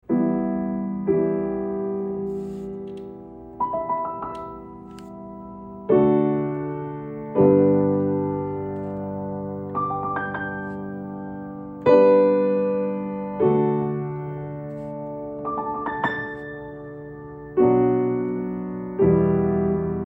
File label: Piano